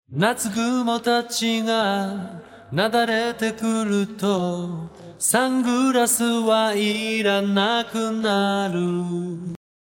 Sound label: test vocal